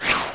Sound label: alien flyby